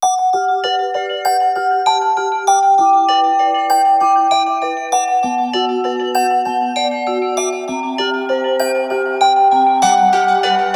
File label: Scrabble Alarm; Sony Ericsson; PlusFourSix; Rika (W705); Lars Edvall